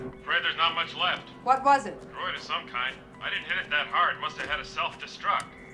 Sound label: Probe droid; Solo; Organa; Viper probe droid; Legends